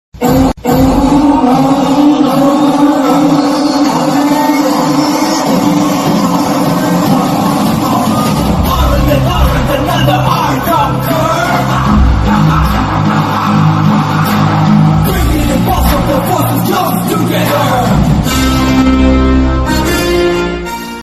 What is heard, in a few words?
title card bgm ringtone; RRR